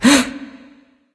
nv breath; smite; awilix

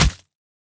minion generic hit; smite; npc; roman archer

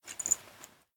mvt armor; smite; gilgamesh

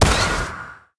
hit arcane; smite; god merlin

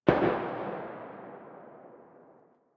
landing gun single; smite; Ares

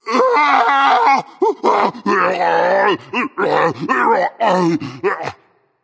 fear berserk; cuchulainn

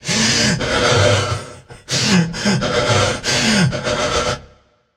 boo hoo; smite; arthur

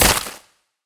impact flesh; Smite; Cabrakan

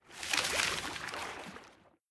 water under swim; sobek; smite

